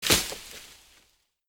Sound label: tree sound; Caravan Beast